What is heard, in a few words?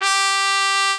mexican trumpet